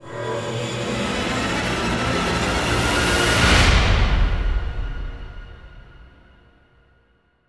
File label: Stinger; extinction deluxe edition; ps4 windows xbox one